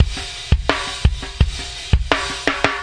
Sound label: 85 bpm, hardrock giant gate, drum loops and breaks